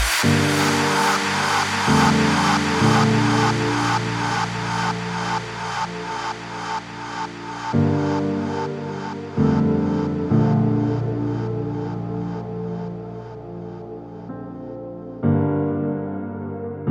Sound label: Piano Acoustica Version